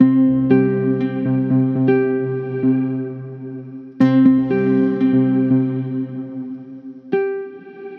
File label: Musical Loop 120 C Major; Visualize; Ghosthack